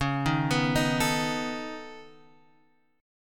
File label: C sharp 7th Suspended 2nd Sharp 5th C sharp down Guitar Open G